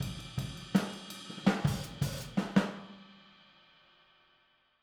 Boogaloo - Outro - Overhead, 82 BPM; Sonic Foundry; Drums from the Big Room The Mixes

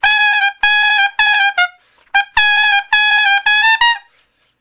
Trumpet Shuffle (Bb); Solos; Crimson Blue and Fabulous Horncraft 4 RnB; Horncraft for R&B

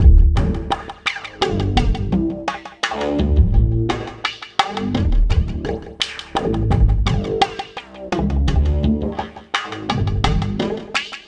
Remixed Acoustic Bass; Sonic Foundry; Leo Cavallo Bass Taster

